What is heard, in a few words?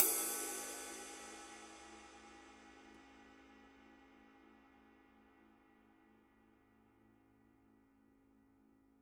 formula 602 classic sounds 20 medium flatride body